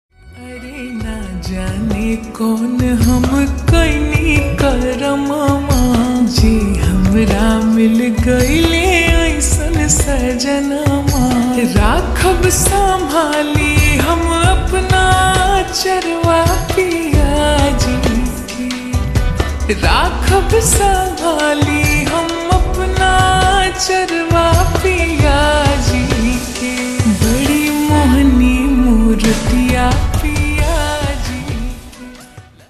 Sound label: Slowed Reverb Ringtone